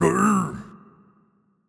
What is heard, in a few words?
blues king hit; Crypt of the NecroDancer